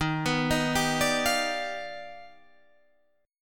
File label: Eb Augmented Major 9th Eb down Guitar Open D